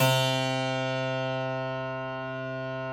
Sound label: C1, Harpsichord; Harpsichord -ped; Total Piano